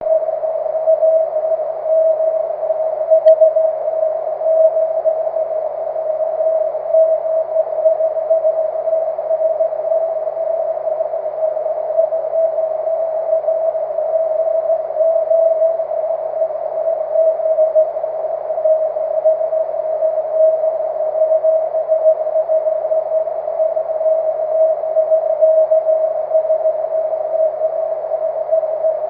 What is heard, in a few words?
cw; g8mbi